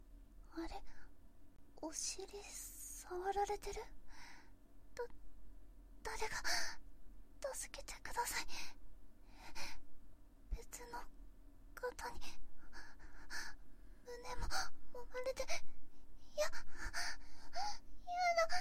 【オホ声】電車内で集団痴漢をされ中出しレイプ

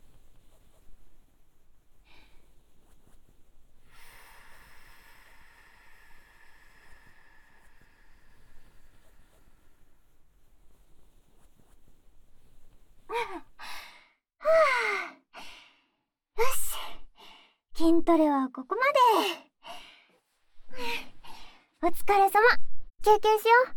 水飲み・ポッキー咀嚼ASMR; いい芝居には甘いものも必要よね？

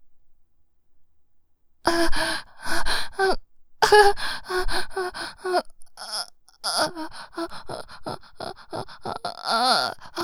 喘ぎ声(アンアン)

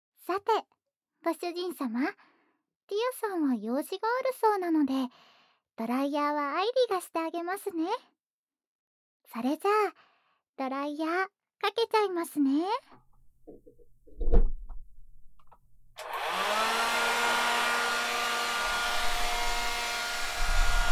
癒しドライヤー&オイルマッサージ; アイリ

